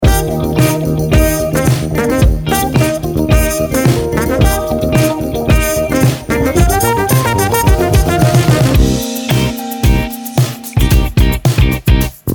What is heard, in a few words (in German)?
110 bpm 4 Takte vorraus